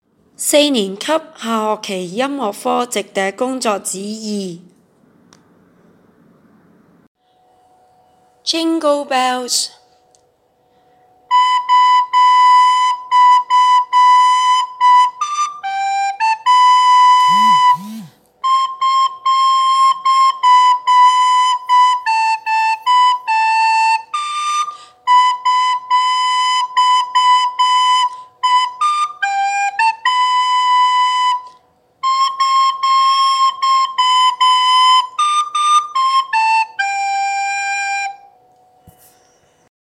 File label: jingle bells